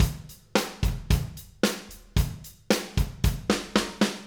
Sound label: Acoustic Live Drum